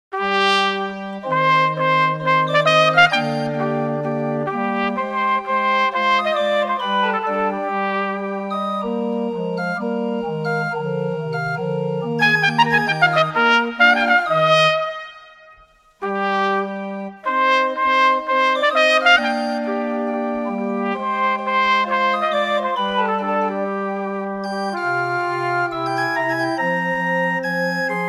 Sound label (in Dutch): trompet tegeijk met orgel; Pietro Locatelli